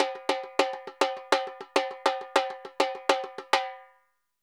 Repique 136; Best Service; Festa Latina VOL-1; Drums & Percussion

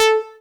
lead syn, Techno Trance

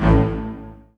string; Techno Trance Essentials

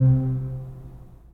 Pipe Mix Celeste, 3D Organs; E-MU Producer Series Vol. 5; 3-D Audio Collection

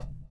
PERC; Spectrasonics; Metamorphosis; 3RD MAN

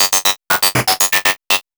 POLYMOD; AMG - Vince Clarke Lucky Bastard

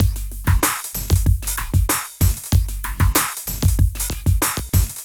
Smooth, R&B Drums

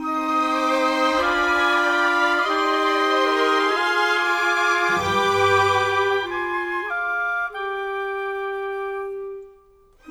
Orchestra; Sonic Foundry (Sony Creative Software); Orchestral VOL-2; Modern

